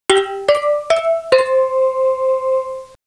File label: CLOCK